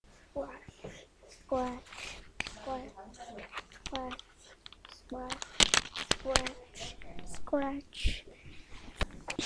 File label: scratch